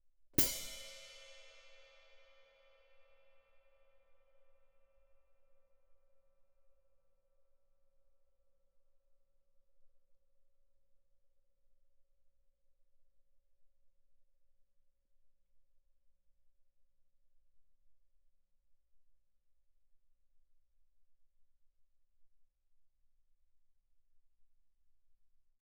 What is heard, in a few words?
hihat, foot splash, ff; Percussion; cymbals